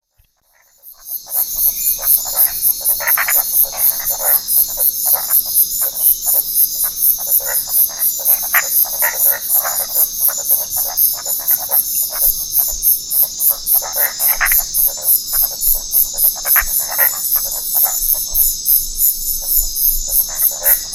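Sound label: Boana xerophylla, frog; guyane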